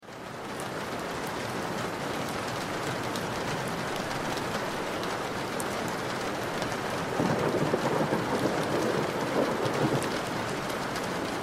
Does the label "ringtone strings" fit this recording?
No